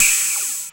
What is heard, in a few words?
hohoo, zaps and beeps